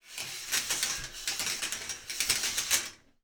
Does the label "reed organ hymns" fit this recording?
No